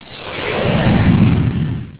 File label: Whush; avanim